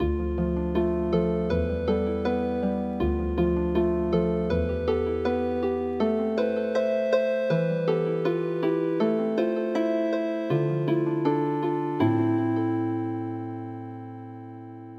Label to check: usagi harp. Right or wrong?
Right